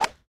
Ball Player Hand Hit Golfball Med

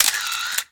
Camera Shutter

